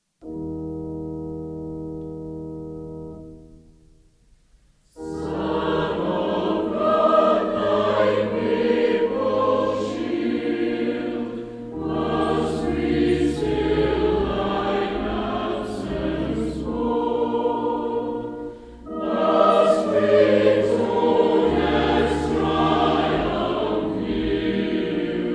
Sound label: son of god thy peoples shield, Northern Choir